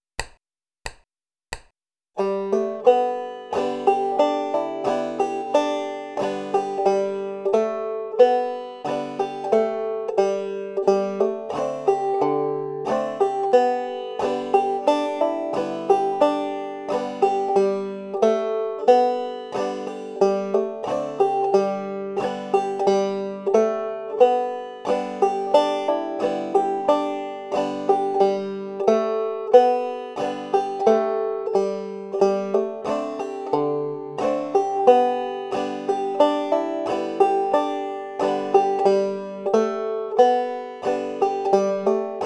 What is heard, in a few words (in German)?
oh susanna mit hammer on